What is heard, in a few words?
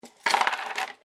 Coins Dropped Wooden Surface; Common coins; Money; Objects